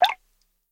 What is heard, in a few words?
Medical Drip; Plant Tycoon